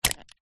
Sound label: industrial tape measure plastic lock switch